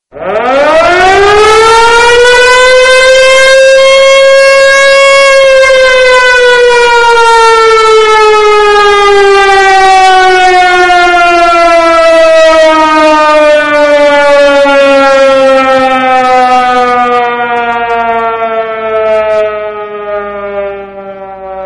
Air raid siren sound effect

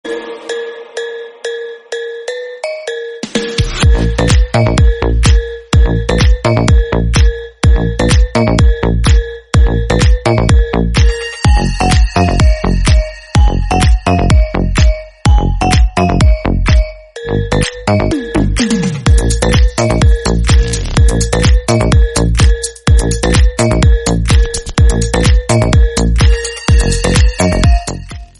Remix trap music

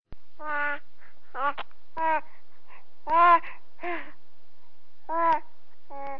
Cooing baby sounds

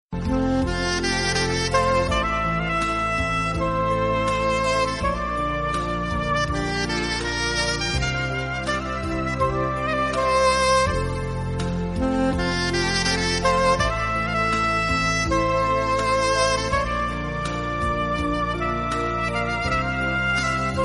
Cool romantic sound effect

